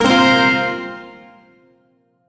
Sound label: Shawzin Hirajoshi Chord; Warframe; Duviri